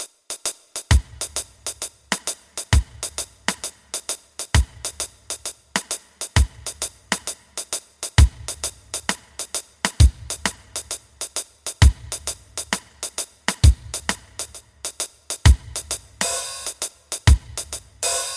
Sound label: Reggae One drop half-time pattern